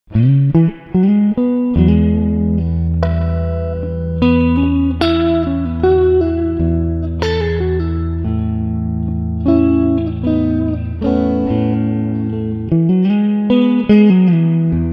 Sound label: Twang